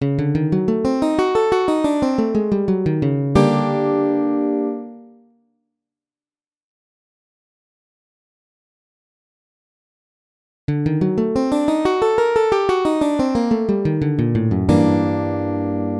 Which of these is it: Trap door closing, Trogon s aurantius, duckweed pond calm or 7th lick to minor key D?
7th lick to minor key D